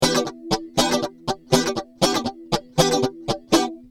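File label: rhythm guitar, chorus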